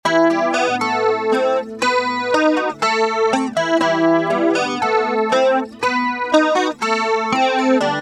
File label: ac gtr mistress